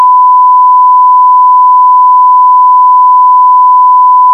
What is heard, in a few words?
sine